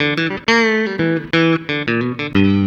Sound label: COUNTRY